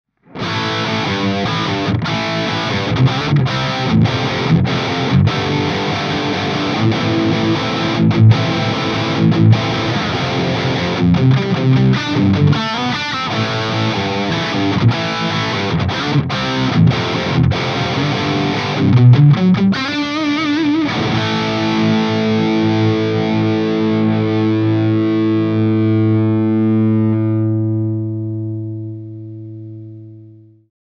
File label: MID plus NORMAL; GRAVITY SPOT